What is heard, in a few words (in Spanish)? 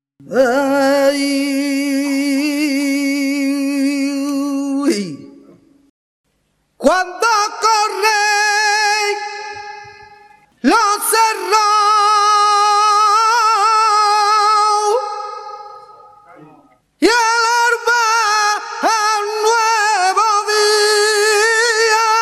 tona, flamenco